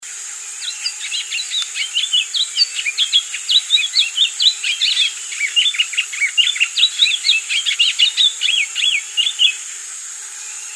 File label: Sporophila americana dispar Semillero Aliblanco